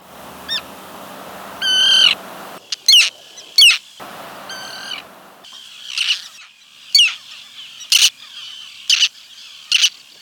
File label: Roseate Tern